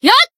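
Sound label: Voice attack, Japanese; kikyou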